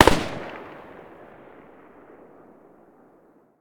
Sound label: saiga muzzle mono far, weapons